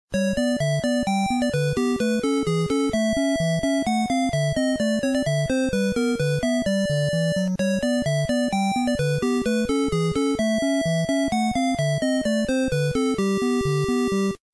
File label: circus nes music stage 2; circus charlie